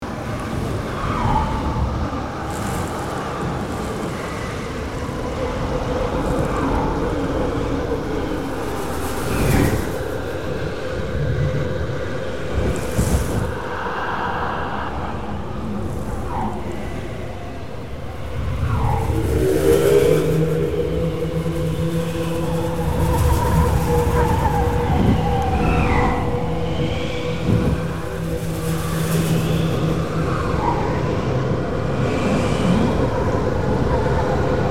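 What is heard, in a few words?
Ambience Blizzard Medium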